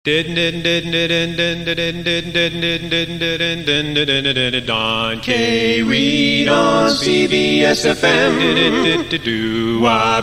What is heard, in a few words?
doo wopp shop jingle